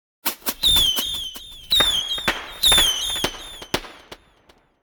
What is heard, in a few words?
Several whistle fireworks pop; ban phao hoa